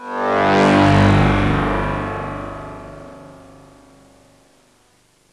WALDORF MW; Rubber Chicken Software Sample Bank

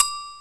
AGOGO HI

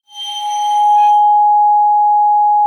arco G#5, vibes; Xsample VOL-4

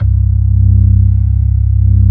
ORG B3, organs; Sound & Vision; Gigapack I CD 2

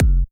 Kicks, Elektron MachineDrum; SPS1 MKII

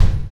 Drag Kick; Sonic Foundry (Sony Creative Software); Drums & Drones; Construction Kits

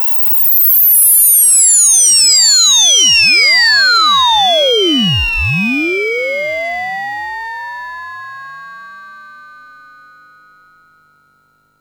long pitch fall; One Shot FX; Sample Magic; Transmission-X

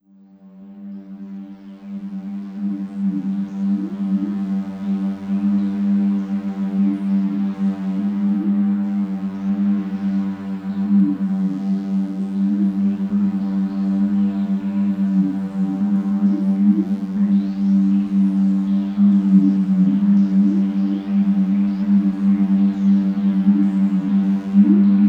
Long Atmosphere; Sonic Foundry (Sony Creative Software); Slow Silhouette

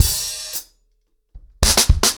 110BPM, acoustic drums, drumloops

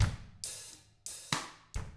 Double Platinum Drums, COUNTY

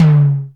FAT OM, TOMS; Back In Time Records; Big Bang

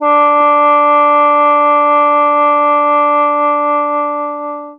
sheng d3; WORLD INSTR 2; WOODWIND AND BRASS; PS SHENG; World Instruments 2

